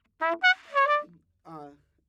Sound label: Trumpet